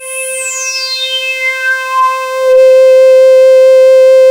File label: RISING C4; Best Service Alpha Dance vol.2